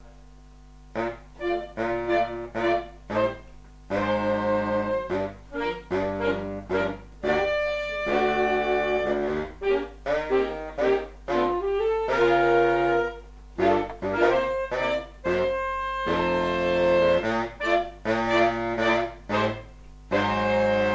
Jolly Old St. Nicholas, A Christmas Jazz Suite, BigBand; Probewochenende X-Mas unter Anton „Toni“ Schneider (Vereinshaus, Vierherrenborn)